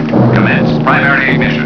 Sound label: primary ignition; cantina